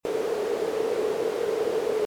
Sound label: noise